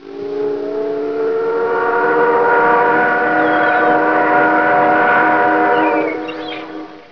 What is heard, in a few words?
whistle; Whistle of the Virginia V